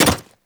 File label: Hyena Micro Step Default, Footsteps; Warframe